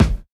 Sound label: sharp kick single shot a key, kick drum sample tuned to the A note; kick drum samples